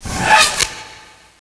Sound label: teleport; bismuth